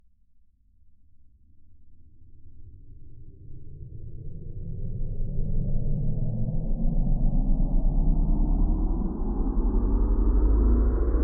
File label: Building Up To Tension